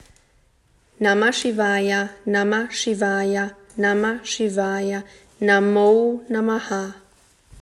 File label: MANTRA